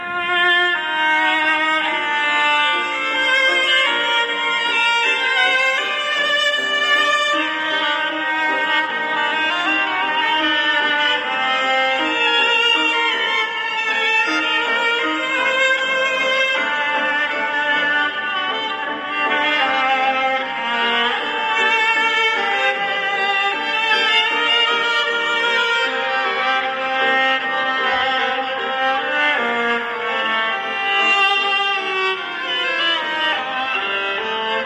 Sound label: Piano Quartet, ringtone; Schumann